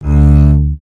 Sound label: d2 bass